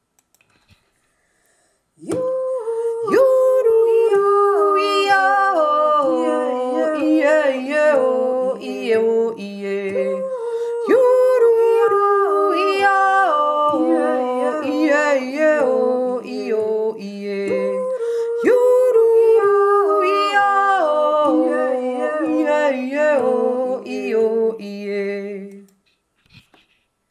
pygm en 2stimmig

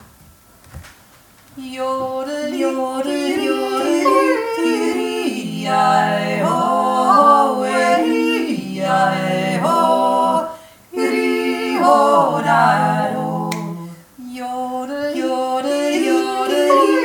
jodl i ti ri

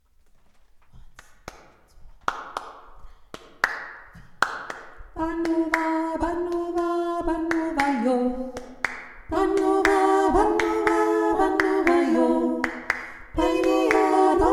banuwa vier stimmen kanon

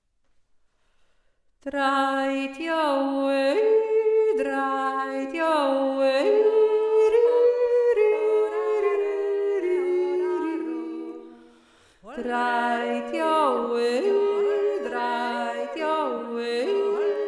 der raucheggerische hauptstimme, jodeln; drinnen und draussen jodeln im waldviertel 2024

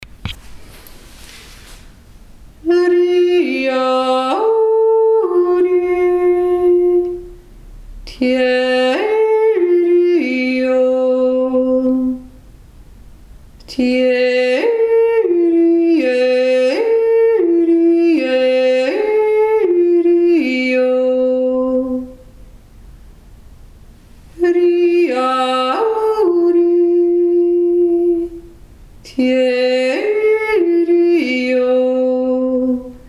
reiberer untere stimme

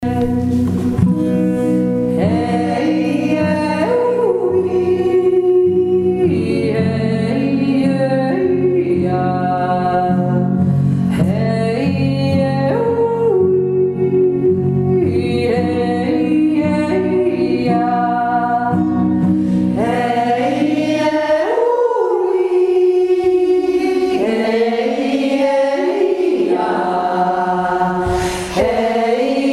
eissch tzenjodler; Singerei - Sing di frei Schloss GOLDRAIN - Vinschgau

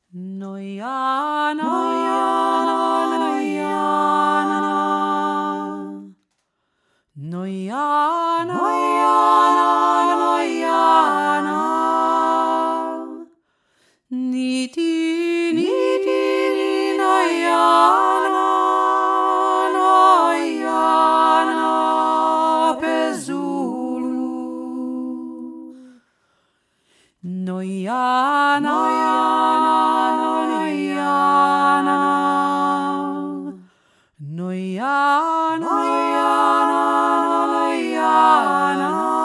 noyana dreistimmig

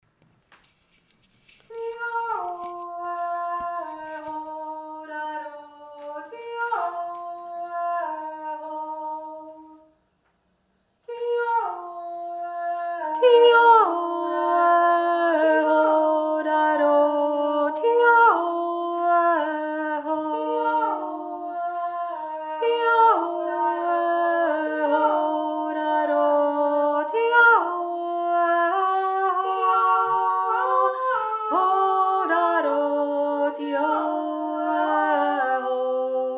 feenjodler

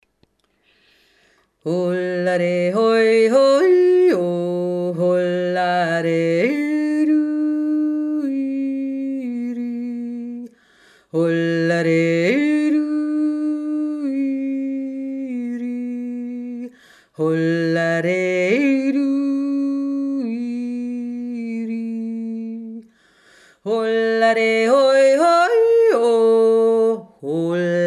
grundlseer tiefe stimme, jodler

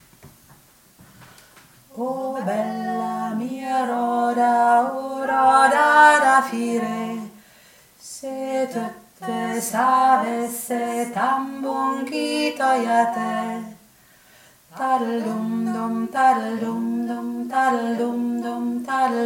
3 stimme; o bella mia roda

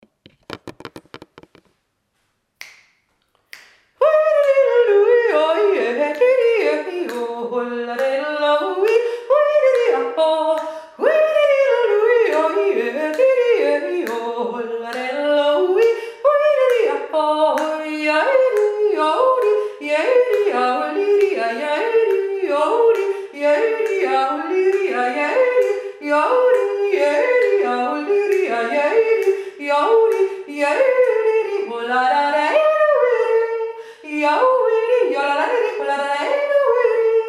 tirolerjodler schneller

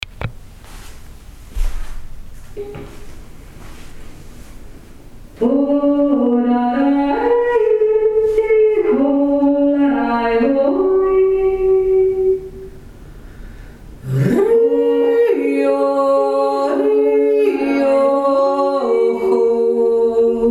seckauer beide stimmen

